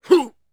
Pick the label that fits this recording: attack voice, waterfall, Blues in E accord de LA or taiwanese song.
attack voice